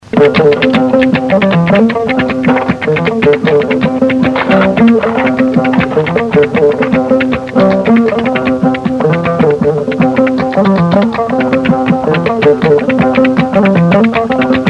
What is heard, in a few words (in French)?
musique du gulmu